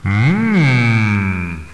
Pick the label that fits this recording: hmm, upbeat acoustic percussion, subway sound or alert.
hmm